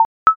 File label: drag